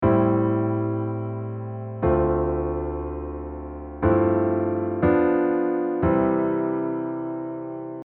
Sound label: diatonic prog